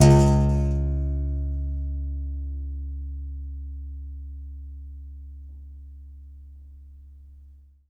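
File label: Contrabass Balalaika, Plucked Strings; E-MU Producer Series Vol. 7 – Old World Instruments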